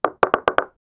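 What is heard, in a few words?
a singular smooth low pitched, clack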